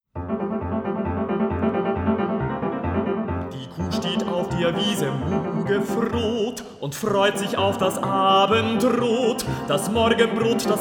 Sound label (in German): lydische Kuh